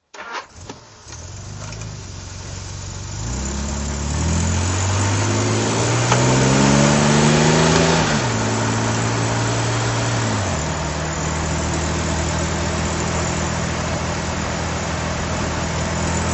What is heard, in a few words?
jag xk150dhc drive